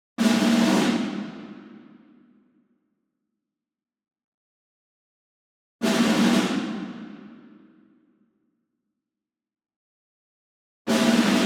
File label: ENSEMBLE SNARE Group Roll Far; boom library; Cinematic Strikes Construction Kit